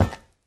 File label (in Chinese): 宝库踩踏声